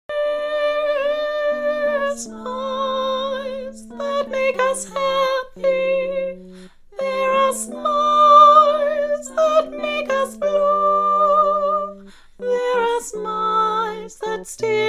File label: Tenor lauter